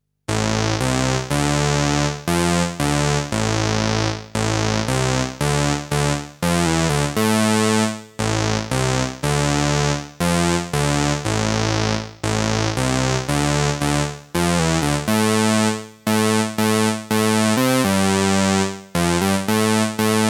SH-1 Bass

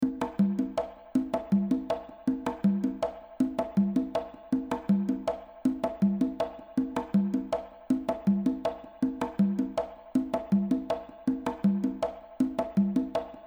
reverb loop Spring Reverb; Eventide

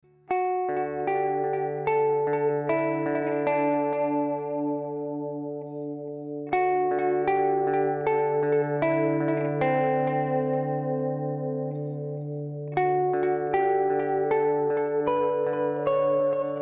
Zoom Delay

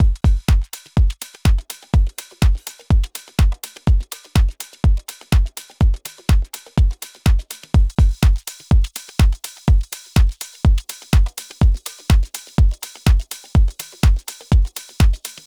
Drumbus Hi Distortion Boost

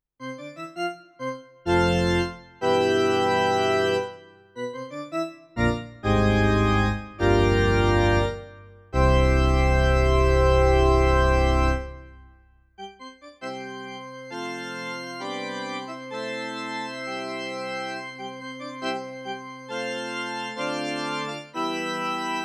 Organ

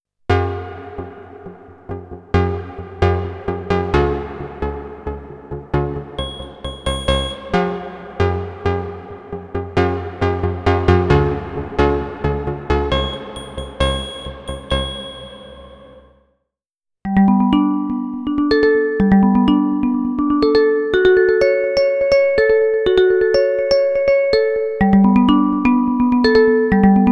Nord Drum 3P Melodic Sounds

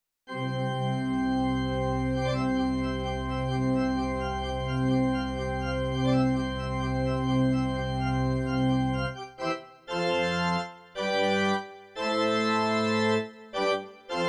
Electro Pipe Organ

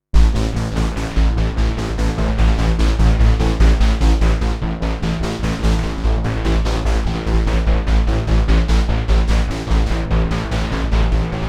Long Pulse Detune

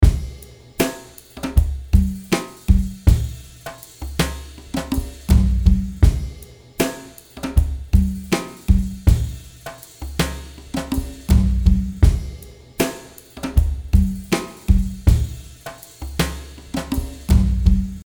DRY NORMAL ROOM RANDOM ROOM

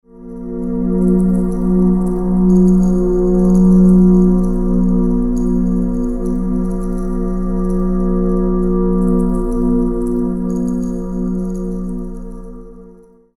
kliks Drone; Gesture

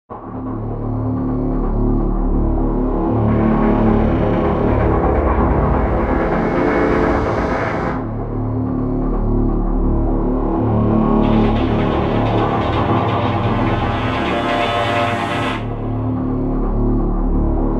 Evolved Woodwind Designer STR Ambiente Combo; VENTO

